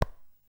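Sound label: collision; Candy Cane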